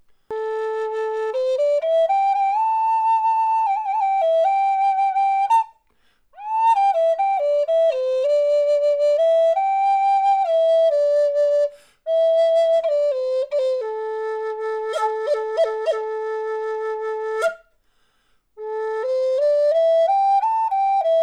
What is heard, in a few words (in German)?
Hoerprobe A Floete Haselnuss